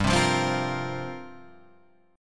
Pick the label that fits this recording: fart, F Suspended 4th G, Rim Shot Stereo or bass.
F Suspended 4th G